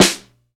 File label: reverb acoustic snare g key; snare drum samples